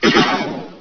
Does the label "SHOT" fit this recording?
Yes